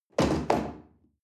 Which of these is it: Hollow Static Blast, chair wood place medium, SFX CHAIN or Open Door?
chair wood place medium